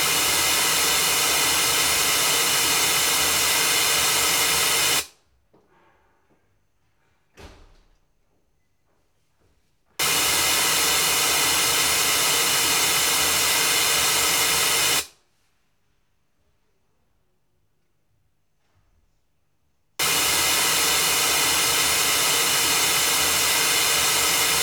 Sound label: white noise isolation; kabina; diktorskie kabiny